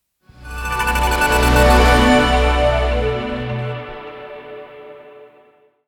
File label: Cyber Logo Sting; raspberrymusic